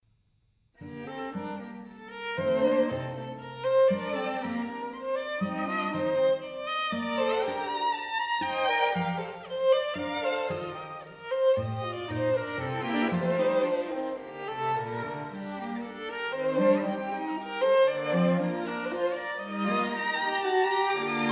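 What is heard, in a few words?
string quartet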